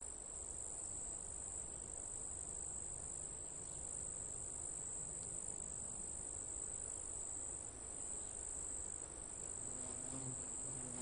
Flatwoods Scrub Rock Springs Nature Forest Crickets Loop; Sound Spark